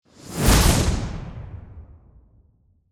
Magic Spell Whoosh, Horror, Magic, Spell, Whoosh, To, Hit; Magic (Sound Effects:Science Fiction); BlastWave